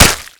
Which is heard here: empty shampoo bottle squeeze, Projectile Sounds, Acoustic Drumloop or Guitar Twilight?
Projectile Sounds